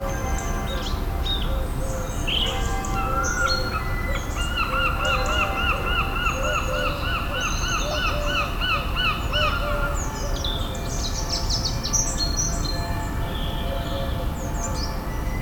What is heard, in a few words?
church bells in st leonards gardens; son clair